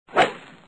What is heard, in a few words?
old golf sound